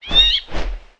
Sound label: Watchman Run